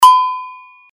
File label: bell